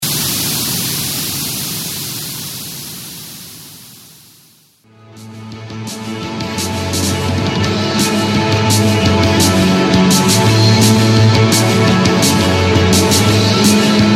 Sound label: Electric Lo-Fi Seresta